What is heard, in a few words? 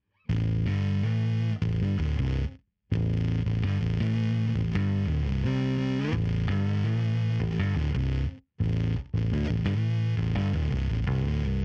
synth harmony; bass pod xt